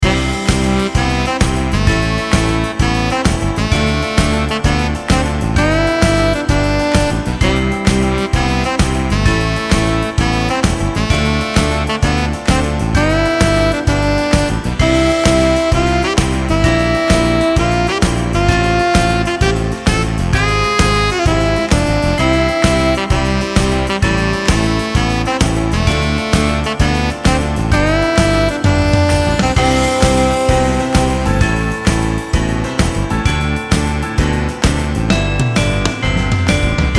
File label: shuffle xmas loop, 130